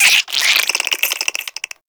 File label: Infested Minion Attack, Vocals; Warframe